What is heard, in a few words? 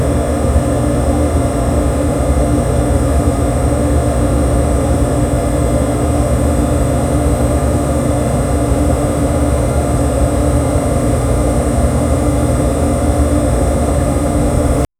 soft hiss of propane; adjustment